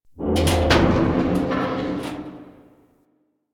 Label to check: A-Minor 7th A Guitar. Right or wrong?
Wrong